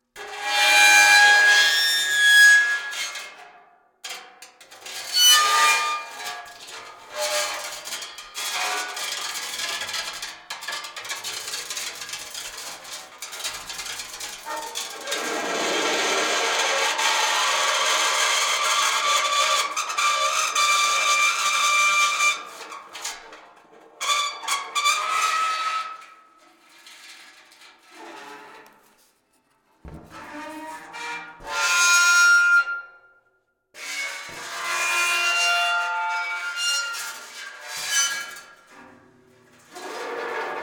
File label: Metal Shelf D, Bright Tonal Creak, Harsh, Friction, Crackling; Double Trouble Audio; Metal Resonances